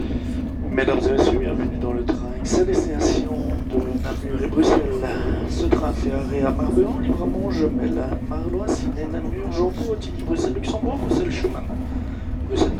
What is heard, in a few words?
Arlon to Marbehan